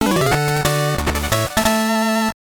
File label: Jingle; Hole in One Golf; GBC